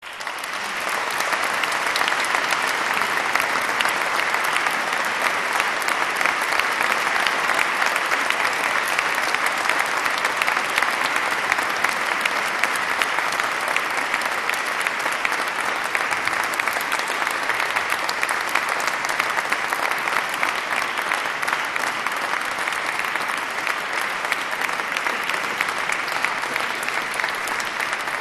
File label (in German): Applaus; Chor und Jubilaeumschor Anna Borggrefe Oboe Jesus bleibet meine Freude Johann Sebastian B